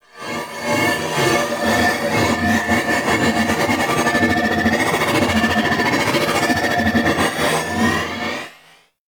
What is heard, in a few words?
Eye Grenade Spin; Ambience; Gameplay; Warframe; Orokin Moon